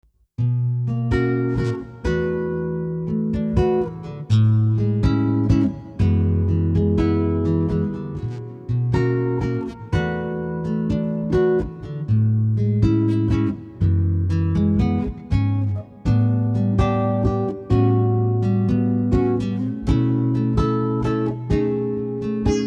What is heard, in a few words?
variax parlour nylon